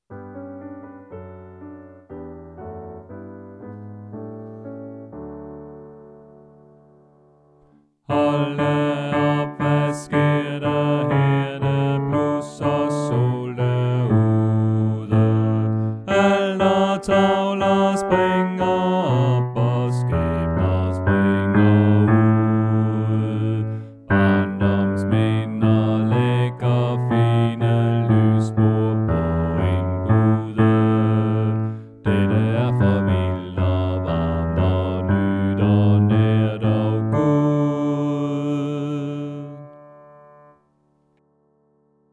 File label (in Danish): Bas